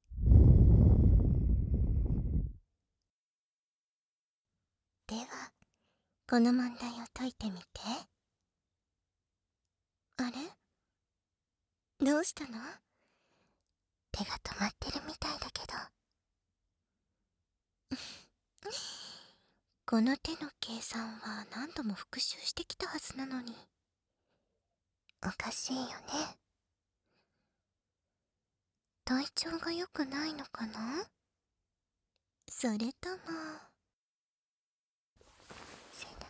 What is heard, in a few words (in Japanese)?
耳舐め; 射精